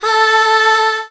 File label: a4, Choir, MIRAGE; Retro Sampler